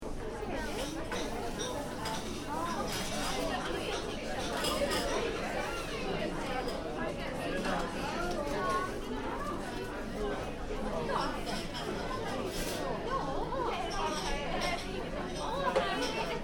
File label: Interior cafe; Café, Interior: Ambience; Cafeterias; Backgrounds